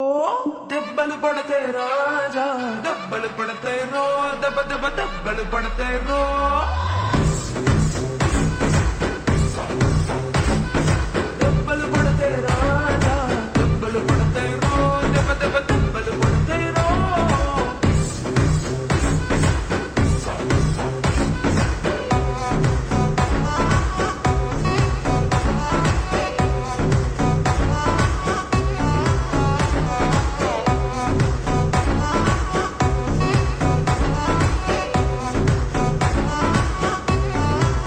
Ringtone Slowed Reverb; telugu; pushpa 2

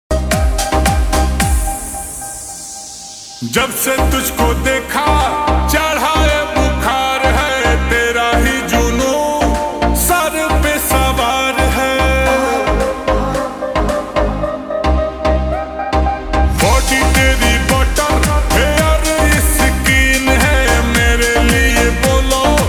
Ringtone Slowed Reverb

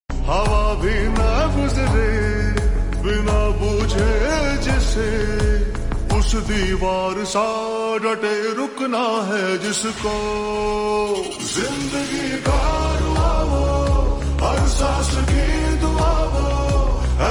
Title Track Ringtone Slowed Reverb

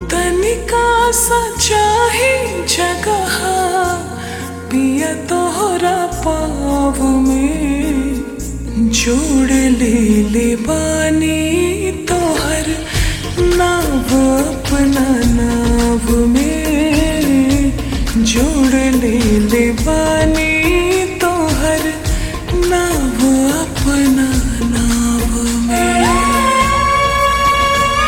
Ringtone Slowed Reverb

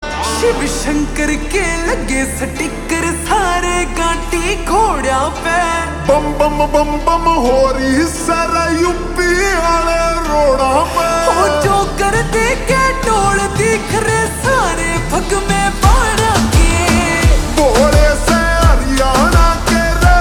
Ringtone Slowed Reverb

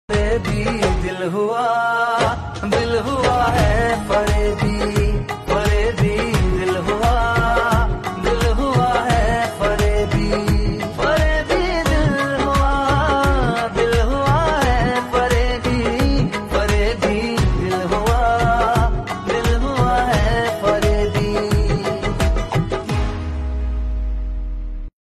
Ringtone

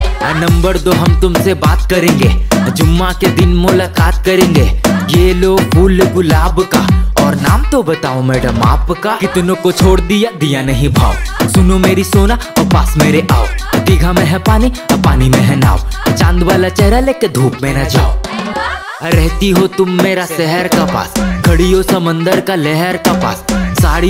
Ringtone

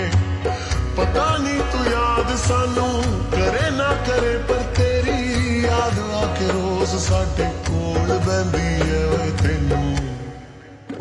Ringtone Slowed Reverb

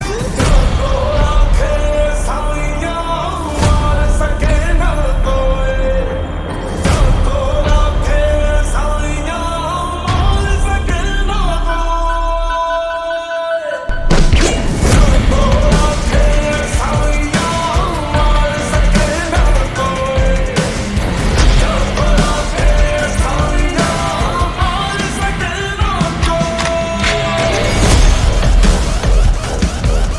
Ringtone Slowed Reverb; kill

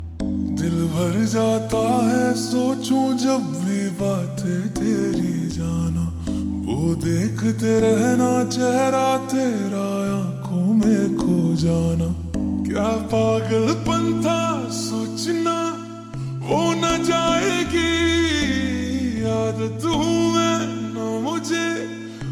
Ringtone Slowed Reverb

